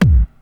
hard bass drumz

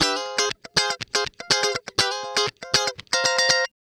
Guitar Licks

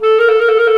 THE CLARINET

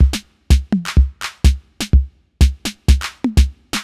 drum loop sixty stripped 125; Sample Magic - Classic Chicago House

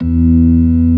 ORG. E2; B3 FULL

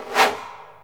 BLOW

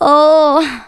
VOCALS SUNG; Techno Trance Essentials

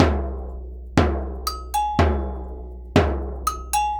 120 AGOG, Percussion Loops, AGOGOS

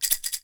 PEYOTE, PERC; AKAI S6000 CD-ROM - WorldSounds

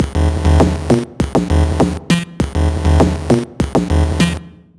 drloop, drumloops, Vinyl Attack; Masterbits

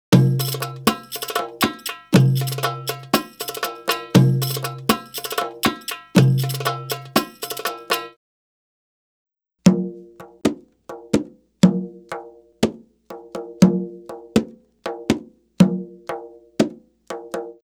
Arabic Rhythms

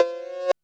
REV COWBL; Back In Time Records; Big Bang

